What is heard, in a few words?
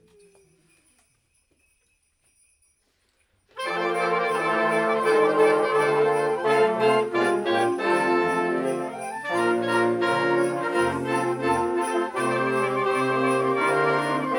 Jingle Bells in dixie